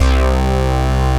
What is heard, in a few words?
PROPHET BASS, OLD SYNTHS; Rubber Chicken Software Sample Bank; SCI PROPHET